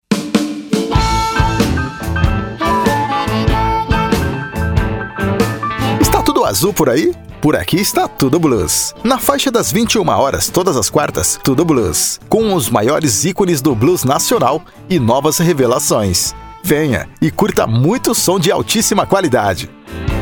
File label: blues